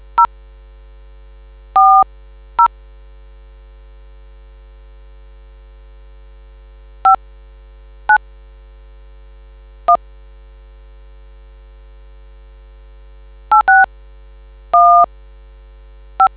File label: dtmf